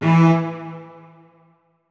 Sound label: notes, keyboard strings; Hideout Furniture